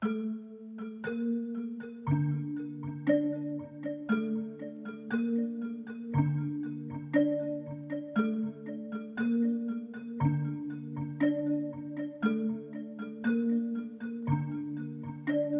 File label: bell, 118 BPM D Min; Cymatics; Ares